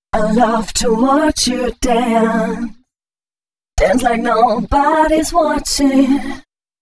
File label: female c# 138, hooklines 136-140 BPM